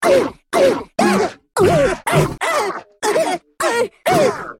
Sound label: Big Hurt Voice